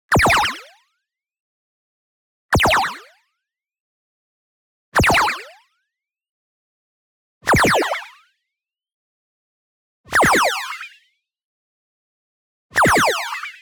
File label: Power Up Short; ZAP; boom library; Anime Essentials; DSGNMisc